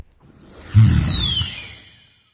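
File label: thinking, nonverbal; smite; voicepack; khepri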